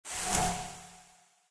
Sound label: brush; smite; zhongkui